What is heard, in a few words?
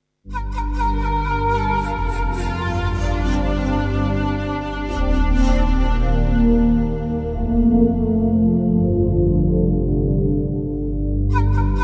flute